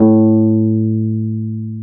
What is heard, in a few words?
FEND KEYBASS A2; Sonic Reality; Vintage Timetraveler